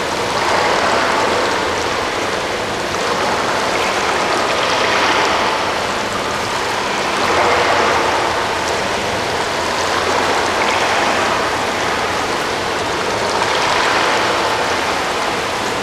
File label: Sphere TankWater Loop